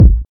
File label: hot bass drum single hit g sharp key, kick single hit tuned to the G# note